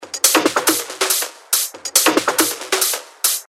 140 BPM, techno loops; Prolix; Revealed Techno Top Loops Vol. 9

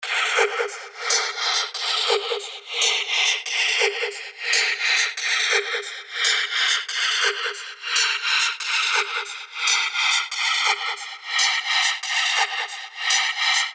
NoiseFX Loop 140 BPM; Powwa; revealed fx loops vol 2

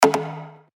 D, percussion; Mortal; revealed percussion vol 2

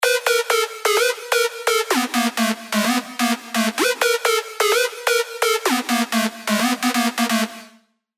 Sylenth1 dirty dutch leads; revealed sylenth1 dirty dutch leads vol 1

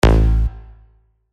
BSHOT A; Ignore; revealed bass shots vol 4

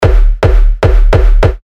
Kick D; Aftershock; Revealed Terra Kicks

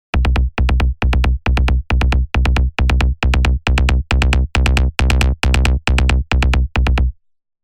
Rolling Sub, rolling bass; Revealed Serum 2 Rolling Bass Vol. 1

